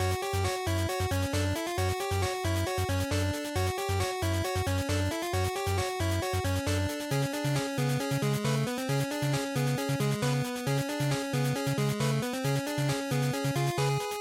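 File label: Game Boy Action Boss Loop, bgm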